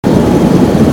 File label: shoot, weapons; airburster